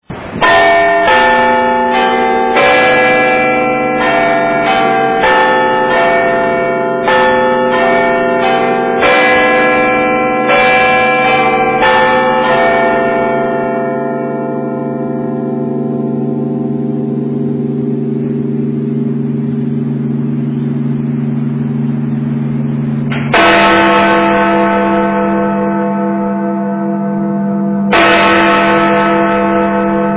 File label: bigben